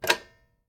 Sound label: telephone pickup; Golden Crayon Sounds